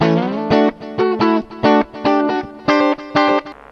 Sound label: strum, guitar